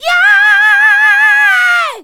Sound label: Male Scream; Sound Bites; Sonic Foundry (Sony Creative Software); Vocal Toolbox